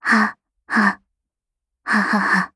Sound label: laugh; Eternal Return; Katja; KOR